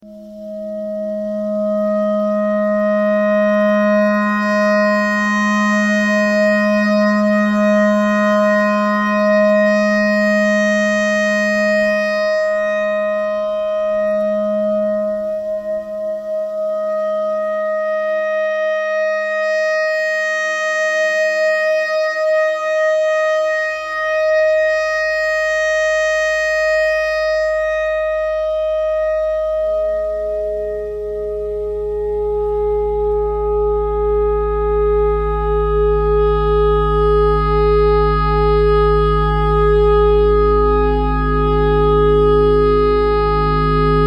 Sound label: clarinet notes e clarinet thread simple